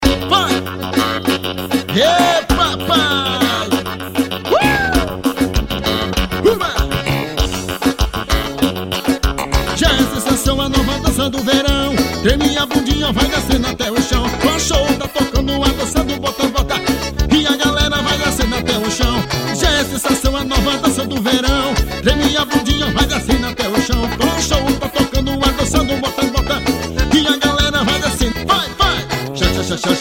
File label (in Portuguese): forro show e teclados